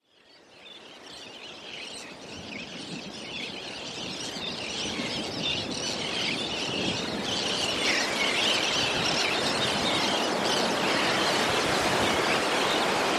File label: light surf with sea gulls